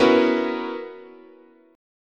B Major 7th Suspended 2nd Suspended 4th, chord, guitar